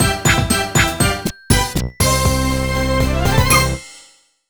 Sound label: Jingle WIN BPM100; super scribblenauts; nds